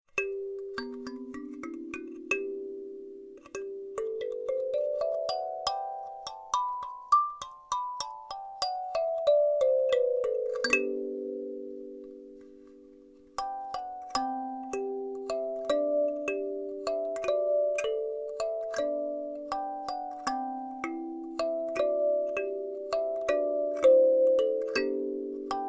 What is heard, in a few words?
Treble G, kalimba